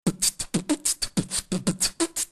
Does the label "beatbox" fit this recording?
Yes